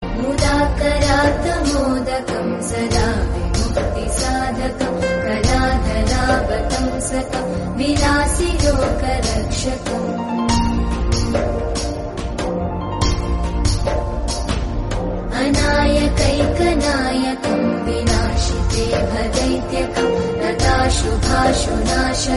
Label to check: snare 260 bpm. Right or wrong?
Wrong